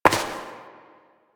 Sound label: Step Dirt; Warframe; Koumei